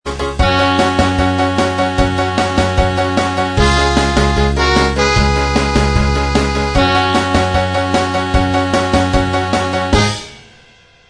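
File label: instrumental, track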